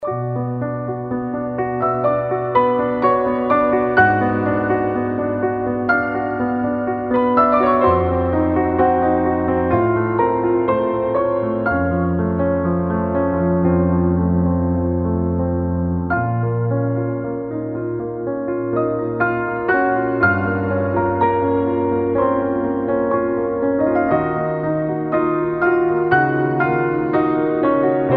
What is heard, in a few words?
Classical Piano